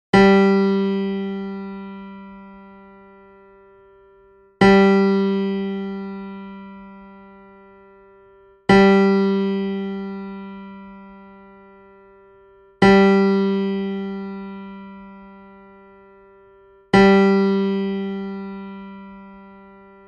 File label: Mando G